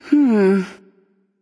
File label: thinking light; smite; voicepack; hel